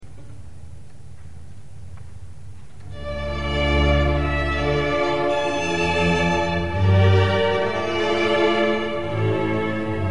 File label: Alla Siciliana; Haendel